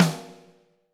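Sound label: snare drum, Drums; Bob Clearmountain Drums I; SD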